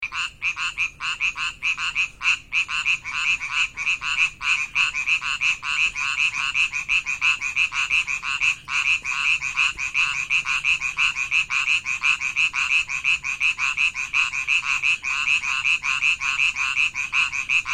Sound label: Hyla savignyi